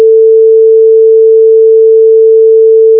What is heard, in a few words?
tone 445; beats